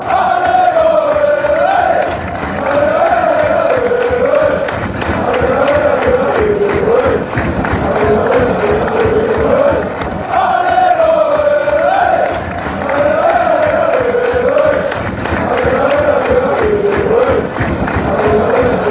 allez, fan chants

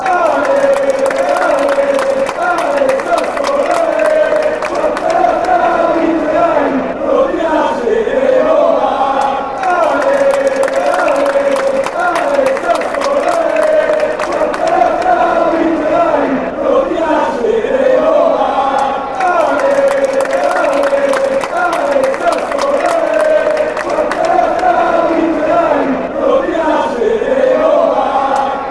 come on, chants; sassuolo

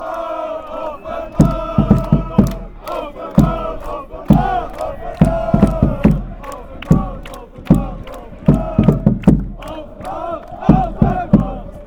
chants; offenbach

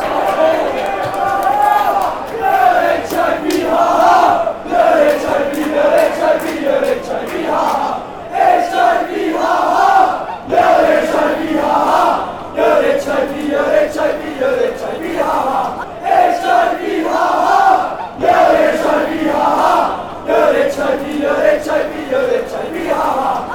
hiv haha

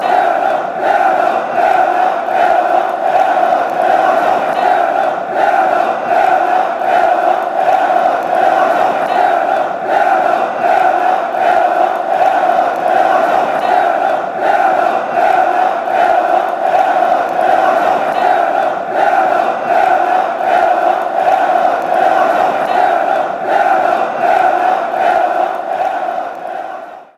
werder werder, fan chants

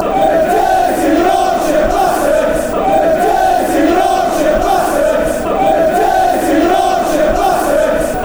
dirty yorkeshire bstards

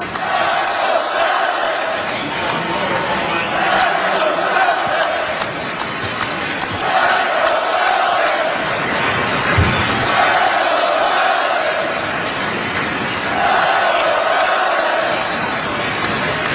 fan chants; lets go celtics

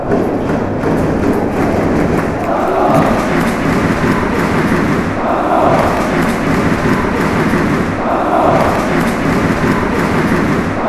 hamburg drums fanchants

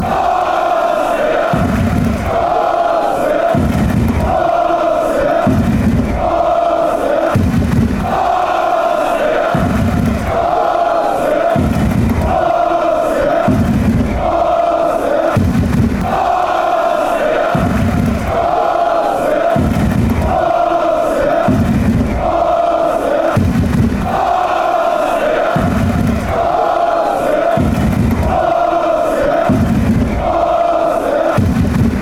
aaaustria, fanchants

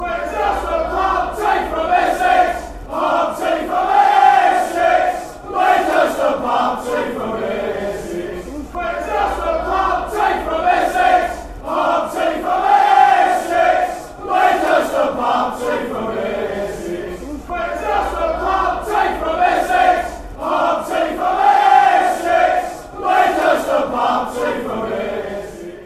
pub team, chants; dagenham22

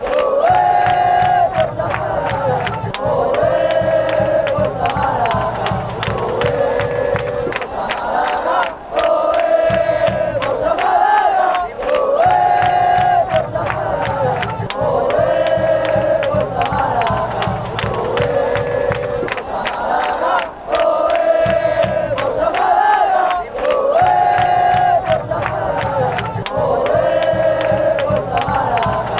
oeee forza, chants; malaga

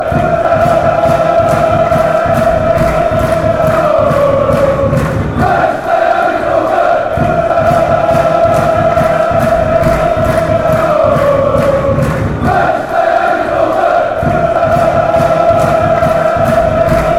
hey oh fan chants

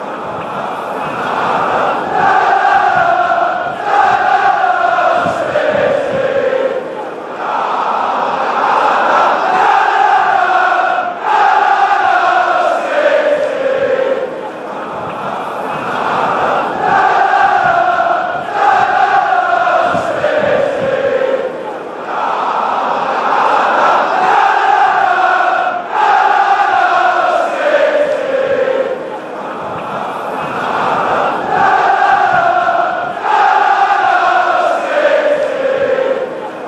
chants; complation of lincoln city chants a

